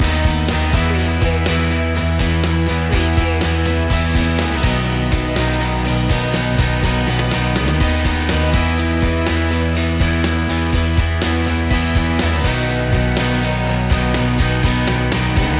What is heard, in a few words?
released to fly loop; pop; pop-rock